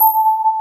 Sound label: Electric Pianos; Wizoo Electric Pianos